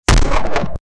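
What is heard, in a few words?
a sound effect for damaging, blaster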